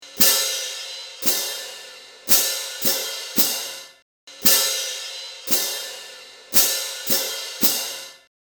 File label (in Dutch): bekken